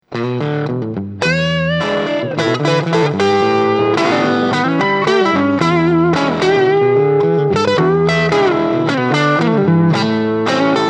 Bar Tele OD

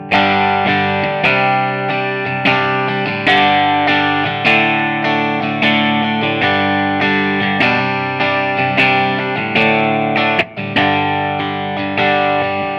Clean Strat; Vol 9; Treble 15; PPIMV 17; VVR 17

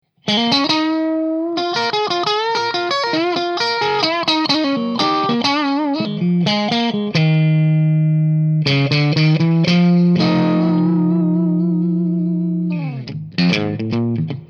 Strato

